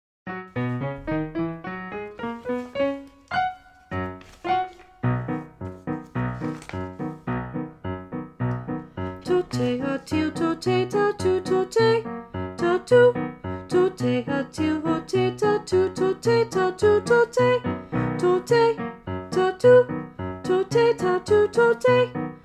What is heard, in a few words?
Ton Thé Alto 2; elementary honor choir rehearsal tracks